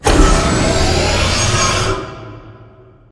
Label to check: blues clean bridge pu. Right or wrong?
Wrong